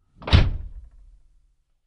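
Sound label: Int Door Close, doors; Cutlass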